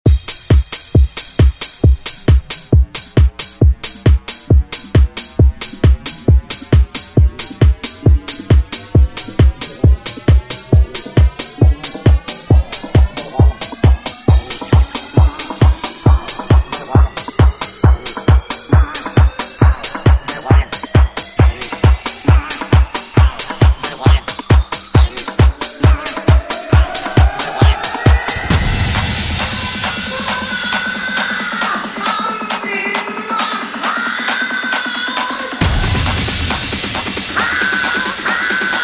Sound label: 90s ReDrum; DJ Mhark